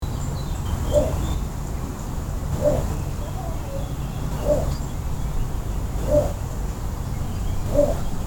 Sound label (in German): zwergdommel